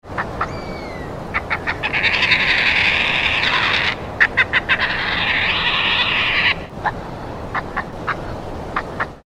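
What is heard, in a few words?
medoed, honey badger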